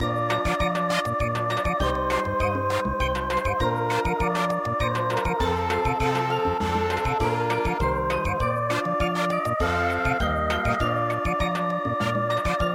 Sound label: Samba, traditional